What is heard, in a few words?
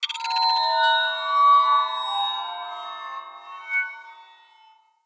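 Cave Crystal Magic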